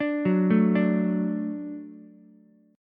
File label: F sharp Minor Sharp 5th, Ukulele; arpeggiated; JGuitar